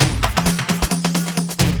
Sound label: Dance Grooves